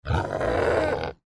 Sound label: pig squeal